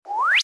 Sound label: Cartoon Slip